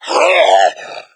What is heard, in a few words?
sight, hazmat zombie; doom3